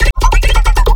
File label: Bass Sample